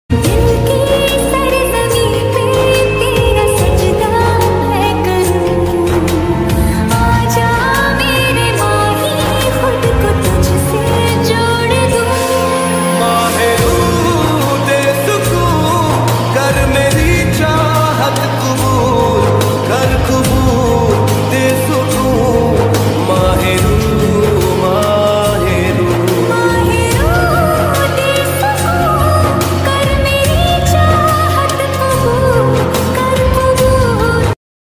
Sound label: slowed reverb lofi ringtone bgm; instagram reel